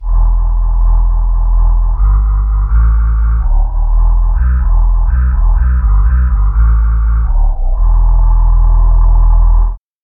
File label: solo vox bass